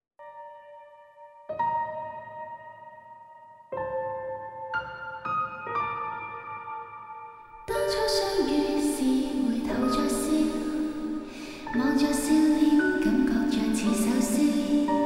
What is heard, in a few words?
dnse church; samsung yp r0